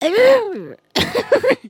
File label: trip, emotes